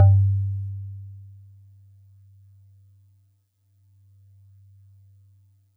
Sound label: Celeste DX100 G-1; Pristine Celeste